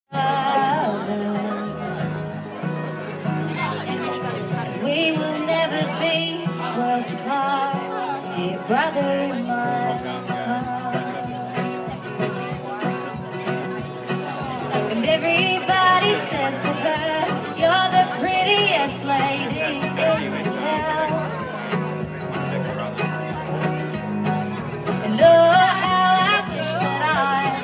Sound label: live music at avoca markets